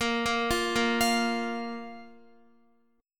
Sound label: A sharp 5th A sharp Guitar; arpeggiated; JGuitar